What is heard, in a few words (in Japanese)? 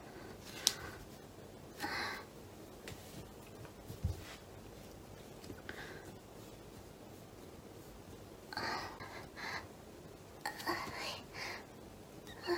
美少女の放課後ラブラブエッチ中のアヘ声ボイス